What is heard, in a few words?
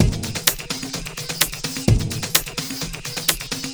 Houseloops 128BPM; Vengeance Samples